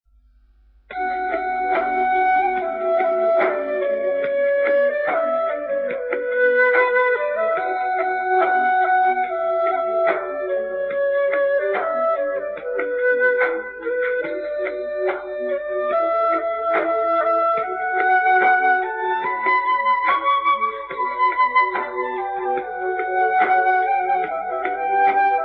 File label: fleita